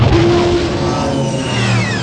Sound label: ELECTRO, SPATIAL; East West - Global Sounds